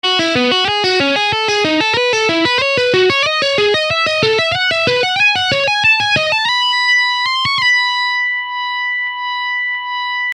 Hybrid Picking

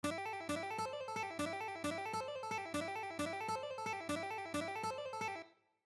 Legato Guitar Lick

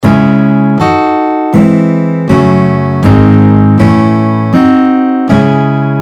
C Sharp harmonic minor chord scale, guitar

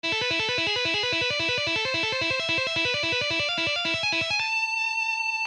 Shredding Exercise

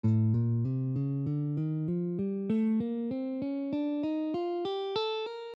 A Eight Note Spanish, guitar

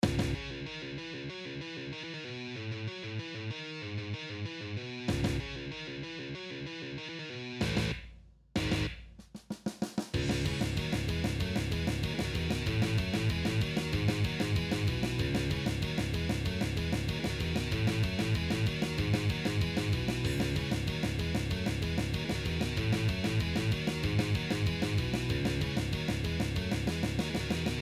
easy metal riffs, guitar

